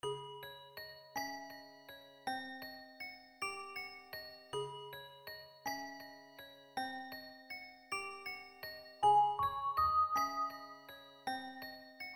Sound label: Lullaby